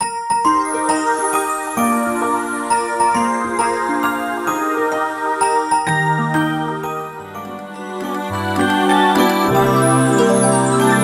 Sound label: Jingle Birthday